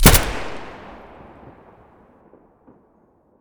abakan muzzle stereo; STALKER; IWP

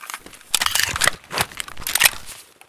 deagle reload

